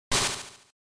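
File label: Game Boy RPG Defeat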